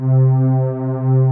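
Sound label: analog strings; Pro-Rec - Synthsonic; TRINITY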